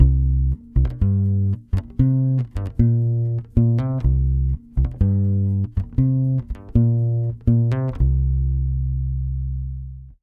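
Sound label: 120 BASS C minor 7, Brazil Bossa; USB Soundscan; AKAI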